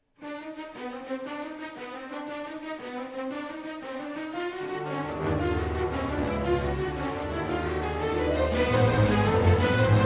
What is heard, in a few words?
suspence short; Chrome